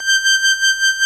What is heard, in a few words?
ORG Vox G, Continental Vox Wah Bar; Roland LCDP09 Keys of the 60s and 70s 1